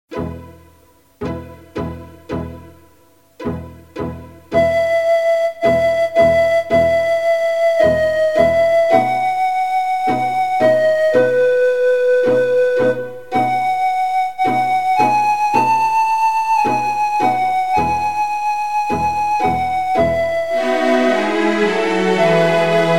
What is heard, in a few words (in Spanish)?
campanas de belen